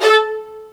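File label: MARC VLN E5, violin; AKAI S-Series CD-ROM Sound Library VOL-2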